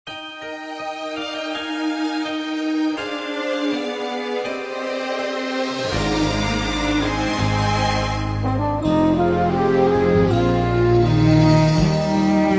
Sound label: Instrumental Hymns